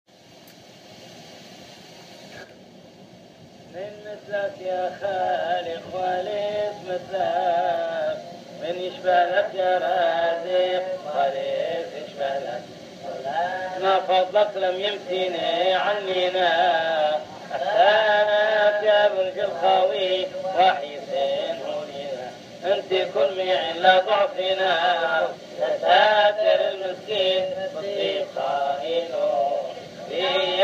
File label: Mi Kamokha Arabic